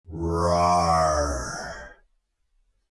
rawr slowed

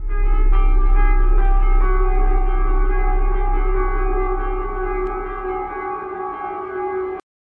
halloween clock

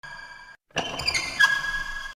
move vent; ennard

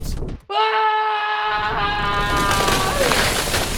scream; bfdi

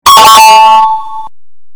check mark bass boost

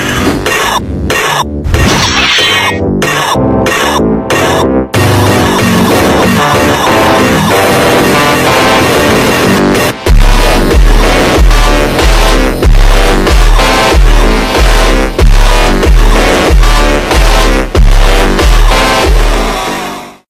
mango funk

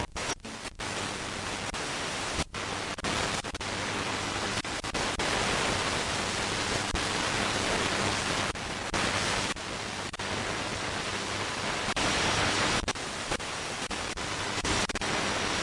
static radio

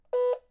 ticketer accept

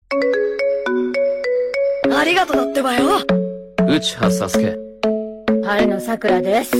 anime ringtone; anime naruto

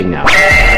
cat reeeeeeeeeeeeeeee

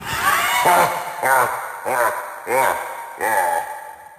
hahahahe

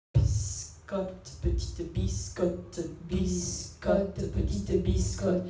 beatbox

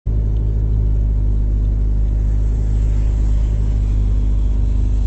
car thunder

